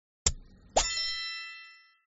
shovel dig; gardenbloom

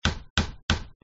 change object hammer; gardenbloom

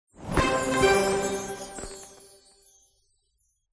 new medal; tetris

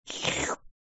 copyer complete; gardenbloom

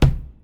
wood Impact; tntbomb

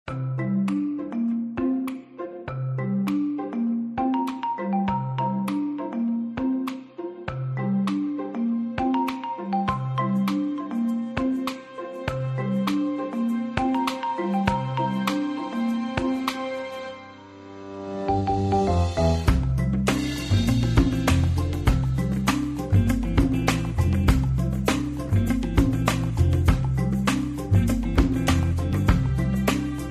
music; 2048

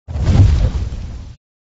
popper explode; gardenbloom

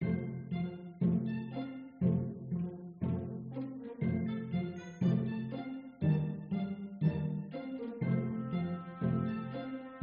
ingame ost world1; gardenbloom

